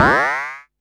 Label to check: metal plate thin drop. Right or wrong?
Wrong